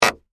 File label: Nail Remove Wood, Industry, Nail, Remove, From, Wood, Squeak, Creak; Hand Tools; BlastWave